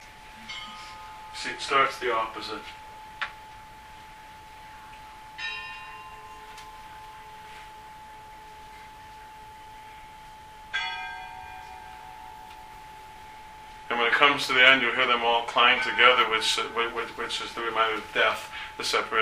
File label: Bell Tolls